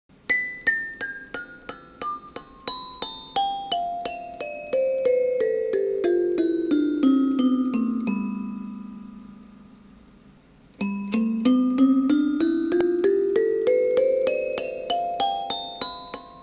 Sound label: yin jie, shuqin